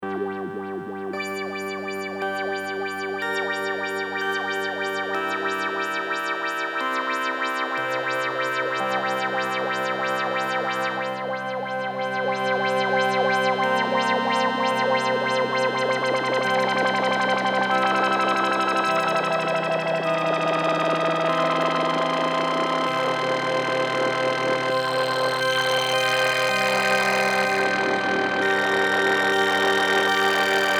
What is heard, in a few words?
LFO Speed